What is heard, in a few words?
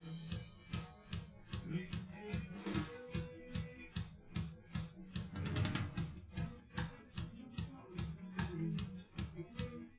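with drums live rec 5cm sim; SASSEC07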